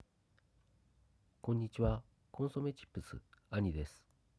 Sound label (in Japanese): 外付マイク・高アプリ